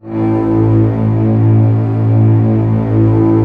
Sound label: orchestra A0 PP; Sound On Sound - Peter Siedleczek's Orchestra